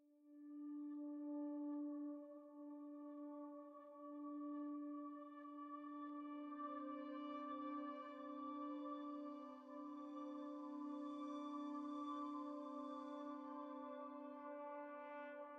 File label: Music Lab Intro